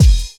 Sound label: KIT, Dance Mega Drums, HIP; Best Service